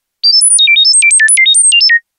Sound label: chirp